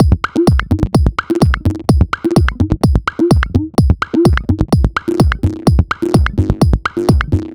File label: Picollo 127bpm, House Drumloops; 90 s Sample CDs; Zero-G House Fabrik Drumloops; ZG Drumloops